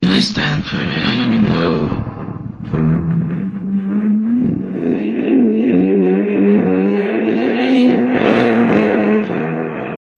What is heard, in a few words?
jingle